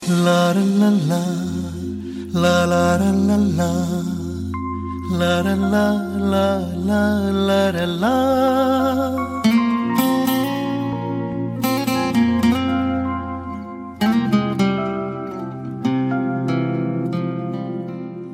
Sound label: soft love ki ghanti ringtone